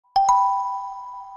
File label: notification tone